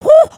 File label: freak out, emotes